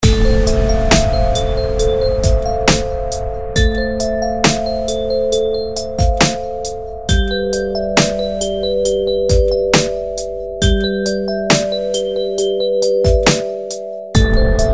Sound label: ambience